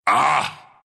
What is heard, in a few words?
pain, ru; Husk; dota2